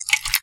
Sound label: nether extract blood; server-nalc